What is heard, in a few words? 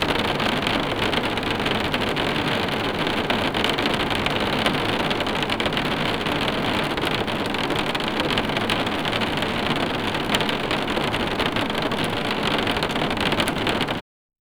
flame in big empty castle